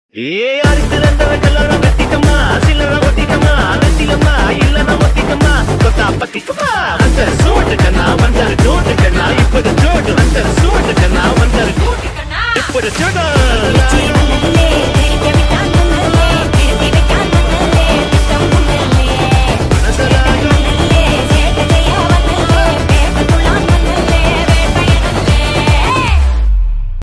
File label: song bgm ringtone; Vettaiyan; Rajinikanth